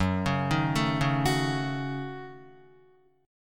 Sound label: Gb Minor 6th Gb down Guitar; arpeggiated; JGuitar